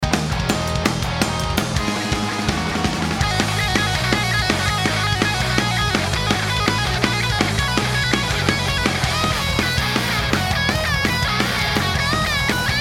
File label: punk band solo; reamp